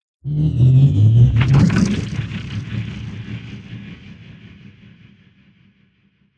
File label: Just Transitions Creepy; soundbits